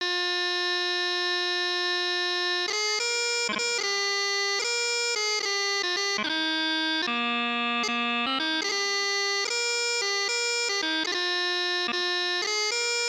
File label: Chanter; Banks of the Lossie